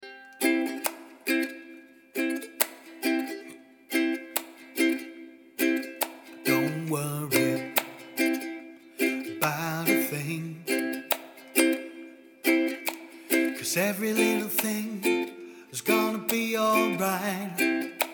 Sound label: one drop reggae pattern